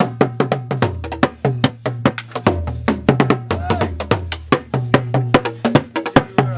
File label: Ohangla Drum Solo 146 BPM; field recordings across East Africa